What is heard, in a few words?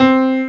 piano c4; pokeymax; self test